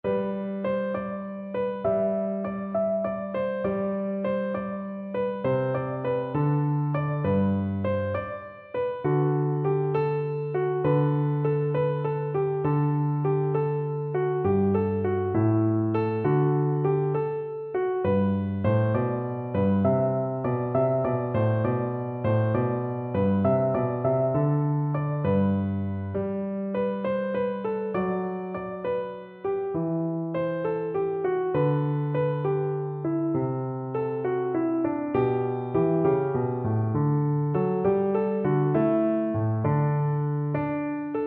pastorale piano

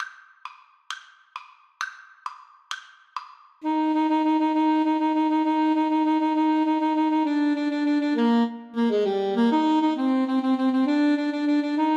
ASAX duet; Alto Saxophone Duet

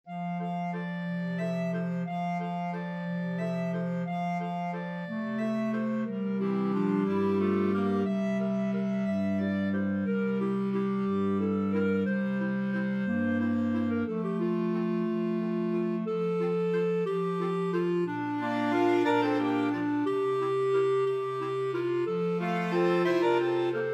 cielito lindo, clarinet choir

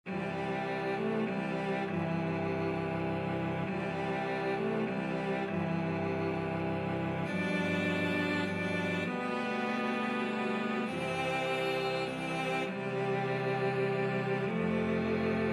cello quartet

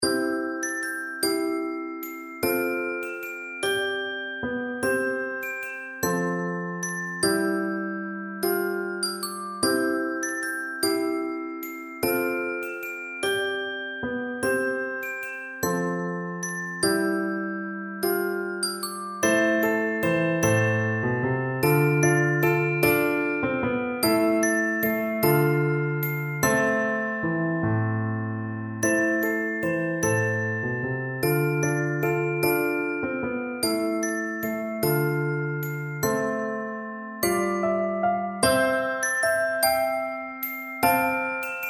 skye boat, percussion

